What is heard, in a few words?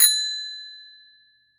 bell; FaceToFace